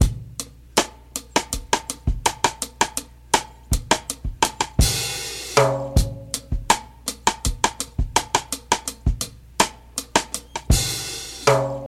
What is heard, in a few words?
81 bpm modern breakbeat g sharp key, Free drum loop sample - kick tuned to the G# note